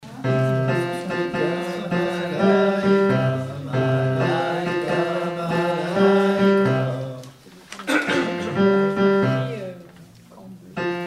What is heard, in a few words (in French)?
hommes